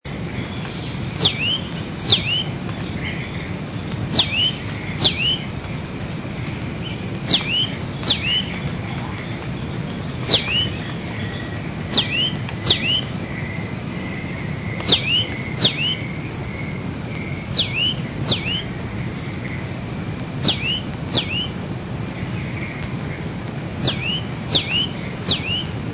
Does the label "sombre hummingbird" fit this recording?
Yes